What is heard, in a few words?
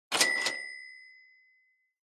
Cash Register Drawer Low